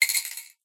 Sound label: lose other; ninetynine